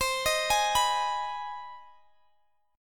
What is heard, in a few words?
C Minor Major 7th Sharp 5th C; CmM7#5; arpeggiated